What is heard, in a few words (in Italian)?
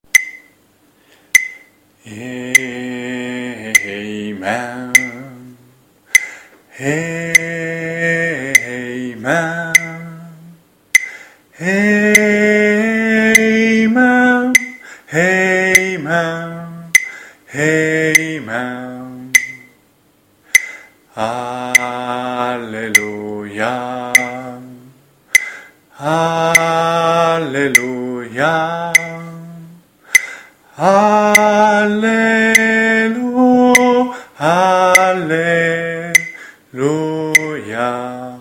amen contralti